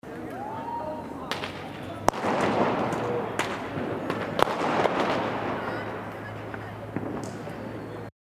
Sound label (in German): Silvester Knaller